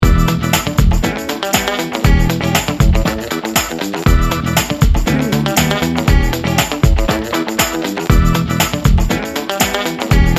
funky lickin good

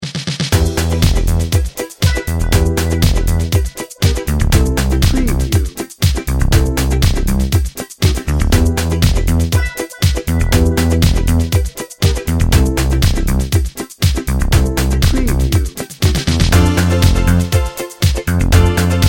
happy and festive; Bright and bouncy with a catchy melody featuring ukulele, recorder and female “la, la, la”

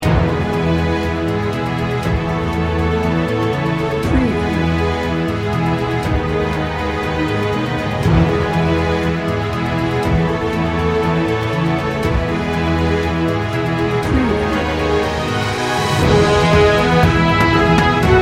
inspiring hopeful cinematic

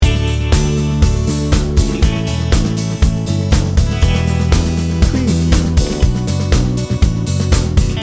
mellow pop rock loop

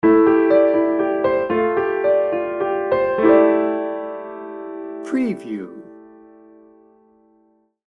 dreamy elegance sting bumper

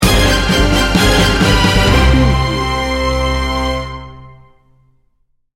epic survival sting bumper

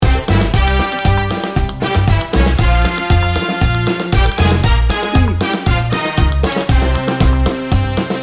paso doble dancer loop

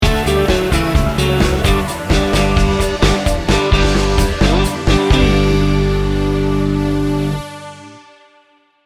upbeat soft rock sting bumper

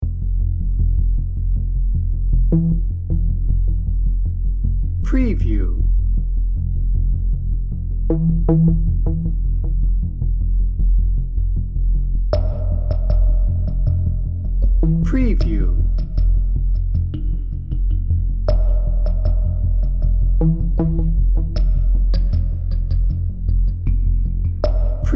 dnb

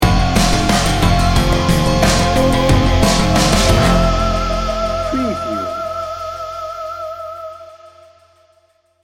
ominous doom sting bumper

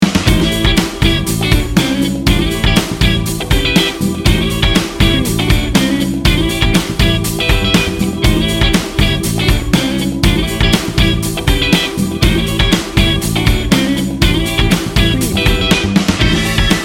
funky and fun

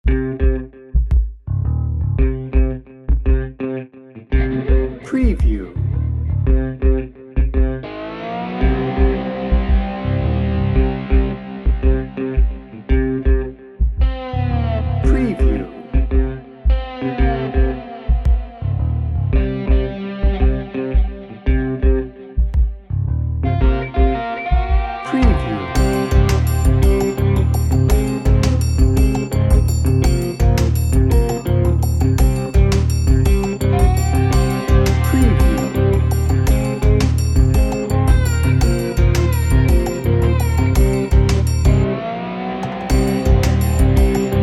swampy tension

